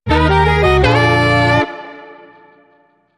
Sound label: Late Night TV Sting